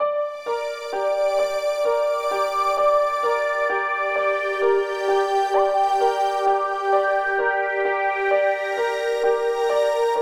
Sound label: Piano Loop; piano loop for travels in games